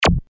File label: syntetic zap